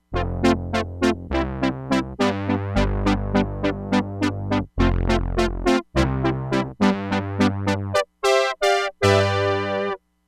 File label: BRASS, Crumar Bit 99; Factory Programs